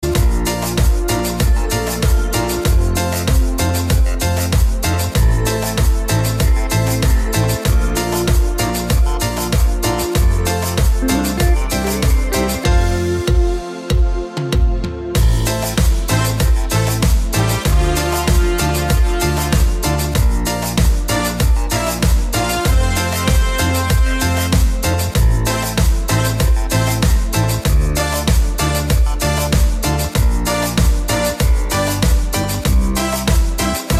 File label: cumbia